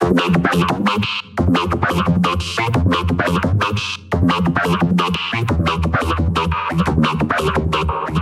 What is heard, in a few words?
Lead; neuro; InsideInfo